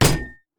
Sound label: yeti vs metal; nunu